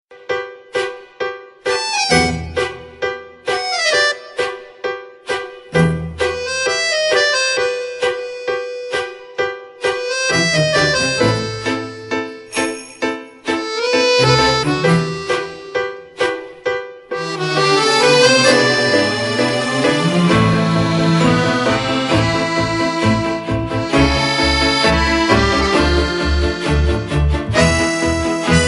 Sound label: Tango